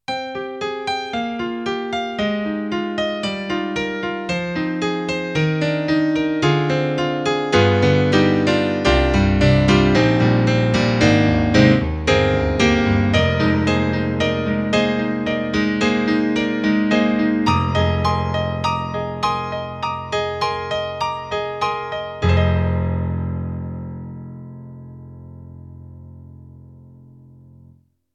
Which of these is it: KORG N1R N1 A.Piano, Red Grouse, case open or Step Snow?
KORG N1R N1 A.Piano